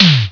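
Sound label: ROCK SNARE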